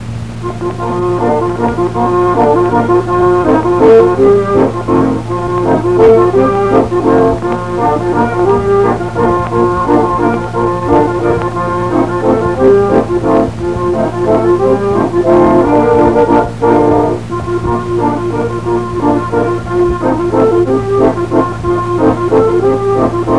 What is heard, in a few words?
accordian; Between 1986 though the early 1990's, Grandma and Grandpa would record cassette tapes feat